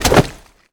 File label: bodyfall water